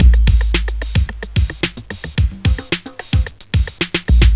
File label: breakbeat; instruments, beats; World of Sound